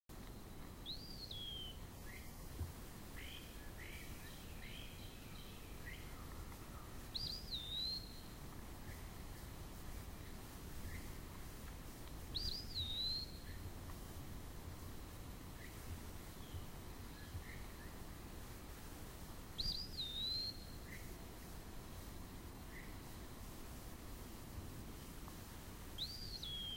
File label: Eastern Wood-Pewee, birds; Indian Springs; The Birds of Indian Springs; audio cassette tape